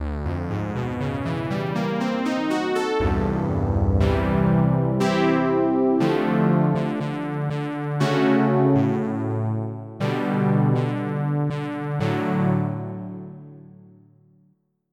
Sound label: Polysynth; EverQuest